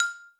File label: Drillbot Step